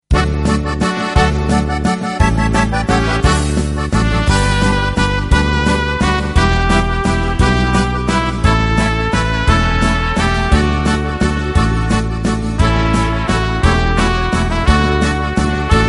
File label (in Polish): instr